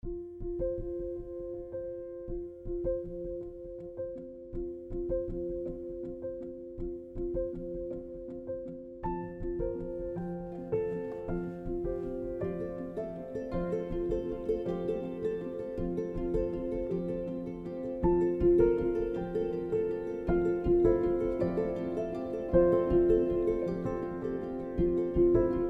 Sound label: Subtle Hopeful Ukulele piano getting into rhythmic ride cymbal